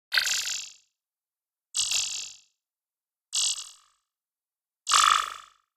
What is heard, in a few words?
Generic Click Digital